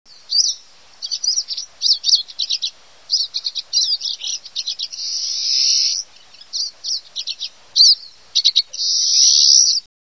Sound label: bird